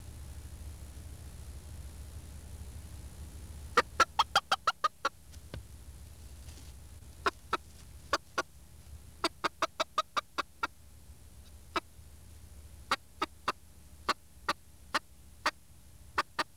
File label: Ground alarm